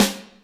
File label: Snr Wet, Alesis D4; drums